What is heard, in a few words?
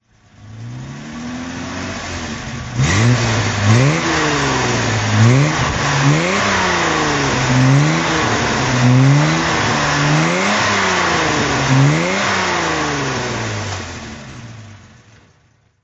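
Jaguar S-Type throttle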